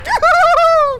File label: laugh